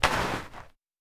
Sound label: Snow